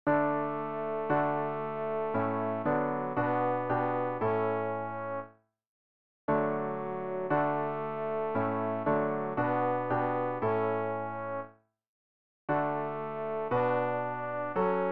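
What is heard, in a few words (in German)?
tenor, Übehilfen; Ref. Gesangbuch; RG 102: Halleluja! Singt dem Herrn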